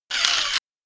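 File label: Mechanism Fast On off, servos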